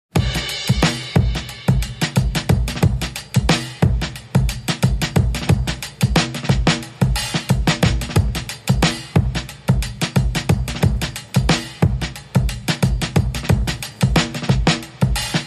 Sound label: Drums Only